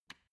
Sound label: switch turn off soft